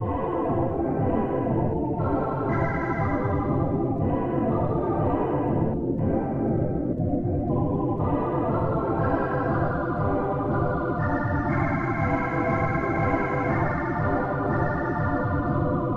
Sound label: Happy Town B Ambient